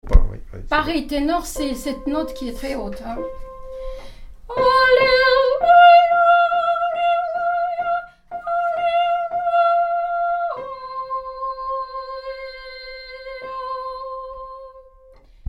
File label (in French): Alleluia ton4 Tenor